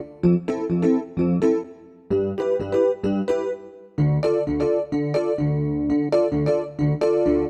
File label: rhodes